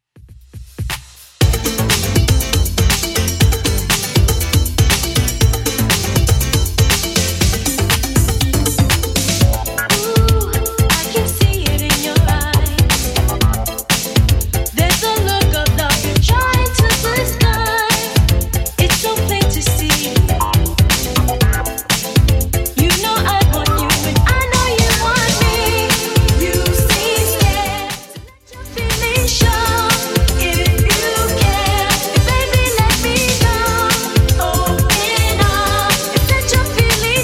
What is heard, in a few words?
80s Redrum 120bpm